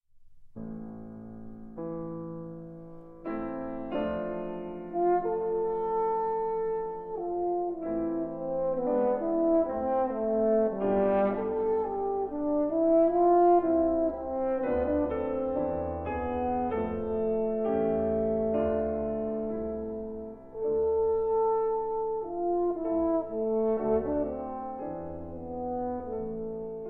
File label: Romances, Nicht schnell, Arr. For Horn; Schumann; Op. 94; Horn and Piano